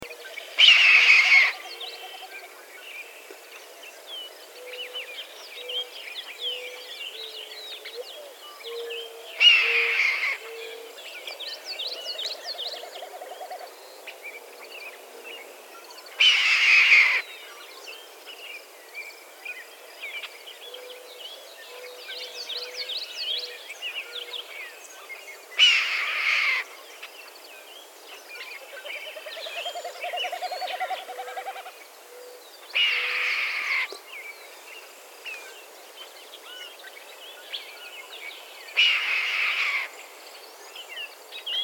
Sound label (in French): buse a queue rousse